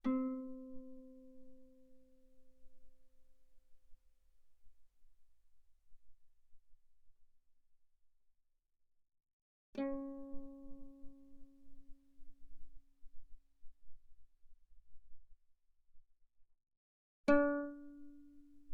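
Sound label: Guitar mezzo forte sul A C4 E4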